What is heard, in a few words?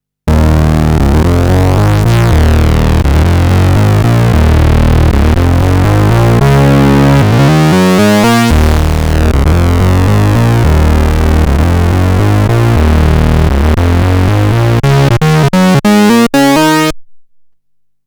Fat Riff